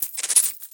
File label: hit, silverfish; mob; minecraft; PixelPerfectionCE